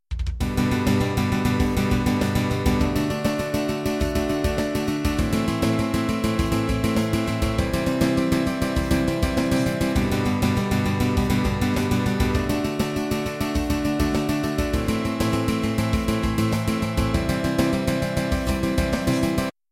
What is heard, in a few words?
alternate picking